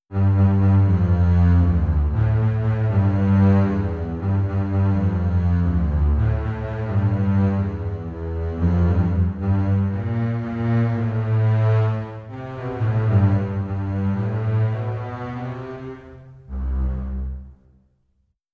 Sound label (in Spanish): contrabajo